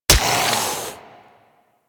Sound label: Tendrils Embed Hit; Warframe; Warframes